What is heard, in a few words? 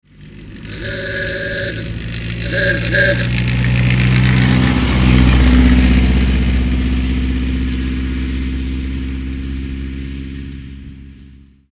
Hanomag kurier, oldtimer